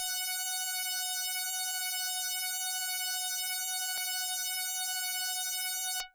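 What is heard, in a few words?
Electric Bass DX100 F#5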